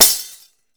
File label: DAF HIT; AKAI S6000 CD-ROM; WorldSounds; DAF+DARABUKA